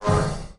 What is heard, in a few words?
Parade March; lethal company